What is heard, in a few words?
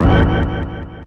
distant echo